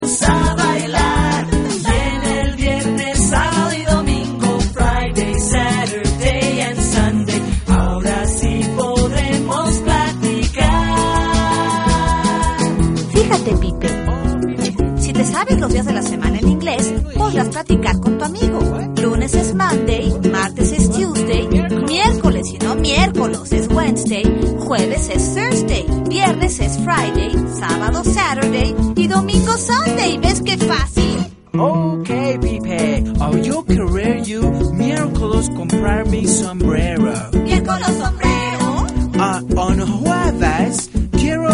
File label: pipe